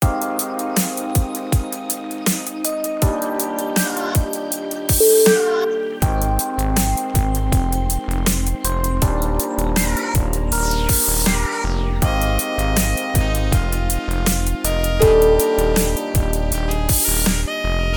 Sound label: chilled sax vibe